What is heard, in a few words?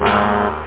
Horns; da capo vol1; instruments